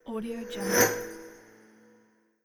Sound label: frost magic ice bolt spell flying by